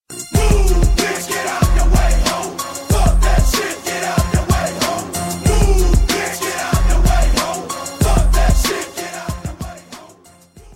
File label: win, music